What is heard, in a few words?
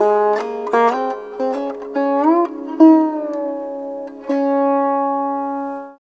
SITAR; Spectrasonics; Heart of Asia